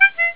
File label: horn